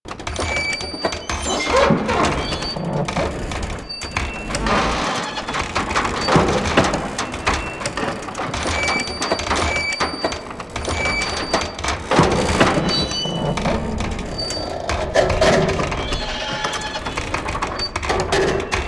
Car Door Slam Slow Loop; Warframe; Ambience; NineteenNinetyNine

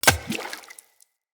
Throwing Knives Impact Water; Weapon Impacts; Environment

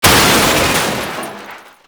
Car Metal Smash; Ambience; Gameplay; Warframe; Nineteen Ninety Nine

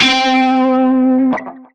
Shawzin Flourish Oct Three C; Music; Warframe; Narmer Shawzin

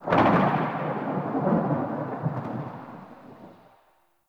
Cloud Thunder; Warframe; Monkey King

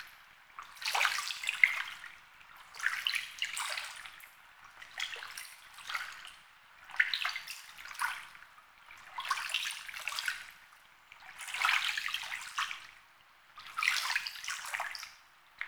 water lapping loop; Ambience; Props; Warframe; TheNewWar; Zariman

